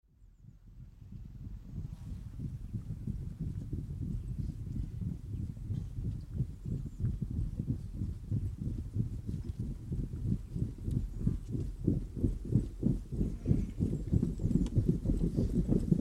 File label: Infantry March By Double-Time; Historical; Hollywood Edge Historical Series; War Horses Armies & Weapons